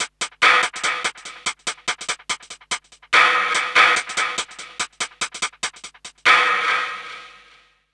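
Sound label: DRYAD hi-hat, drum loop; Big Fish Audio; Uncivilised G'rooves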